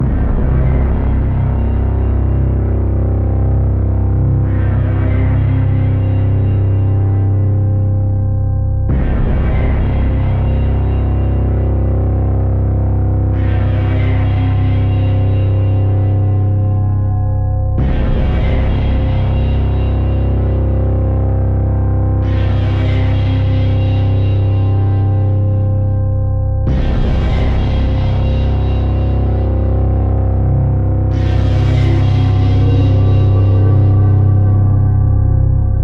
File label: Fear Loop; Face It A Game to Fight Inner Demons